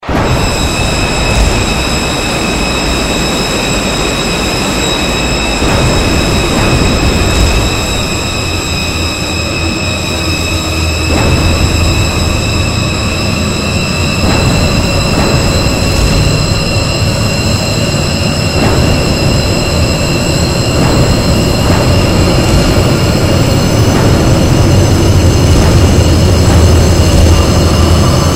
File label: Dorian Stab